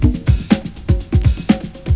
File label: breakbeat; World of Sound; instruments; beats